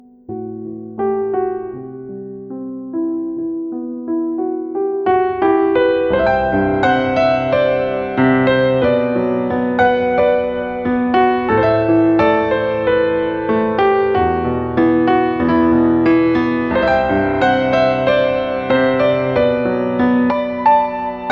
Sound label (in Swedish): vackra melodier